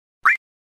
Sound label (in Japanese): 決定音